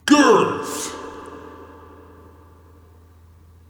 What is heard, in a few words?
Vocal Girth